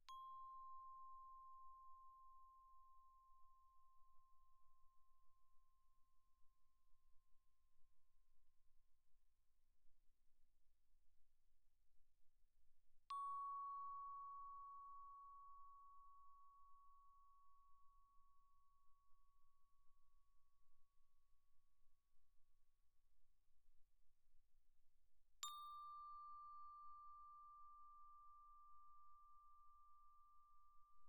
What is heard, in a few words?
bells, plastic, mf, C6 B6; Percussion